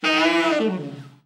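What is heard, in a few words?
TENOR overblow FALL, Sax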